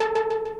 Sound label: synth; osu